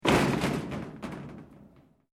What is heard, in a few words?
METAL SHEET MOVEMENT; Metal (Sound Effects:Materials)